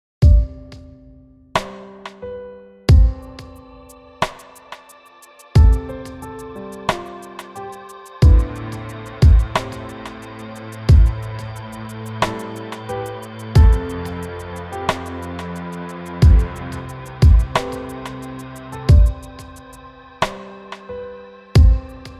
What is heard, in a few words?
Moody Piano